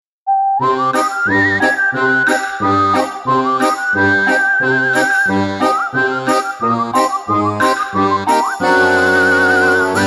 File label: spook